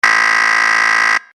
Computer Interface Telemetry Computer Modem Dull Clicks Beeps Cursor Movements Fast; Tom Hutchings